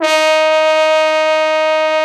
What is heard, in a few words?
TROMBONE; Best Service Gigapack 1